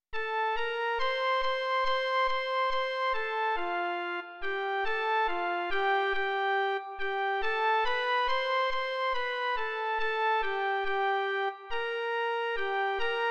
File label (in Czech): Milost Pána Ježíše Krista - jen melodie; Mužská verze; Doprovody Farského liturgie